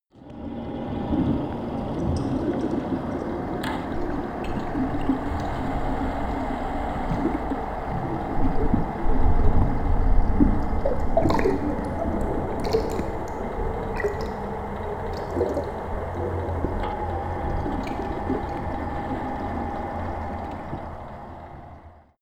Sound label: water fx